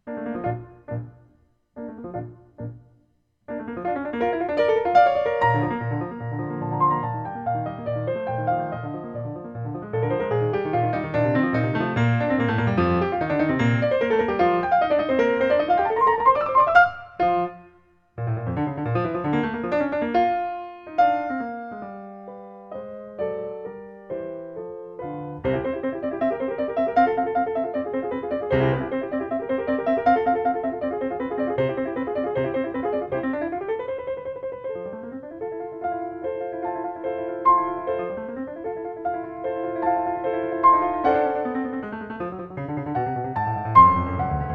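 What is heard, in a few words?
with Delay, Grand Piano; Beethoven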